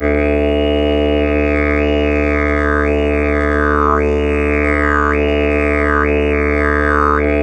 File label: D drone simple, Didgeridoo; Big Fish Audio; Didgeridoo and Other Primitive Instruments